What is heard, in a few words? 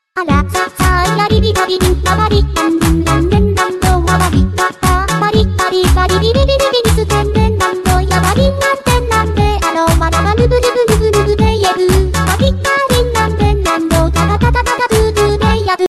Japanese Funny Tune